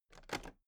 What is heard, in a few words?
toolbox latches open regular